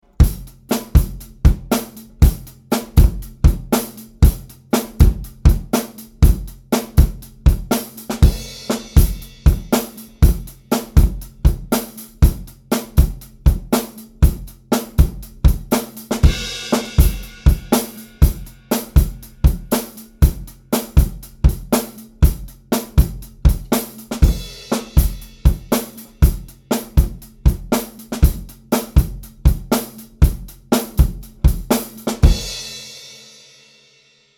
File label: EAD only, Yamaha EAD10